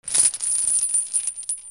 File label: FALLING